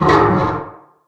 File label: metal frame groan; Source Engine; Portal 2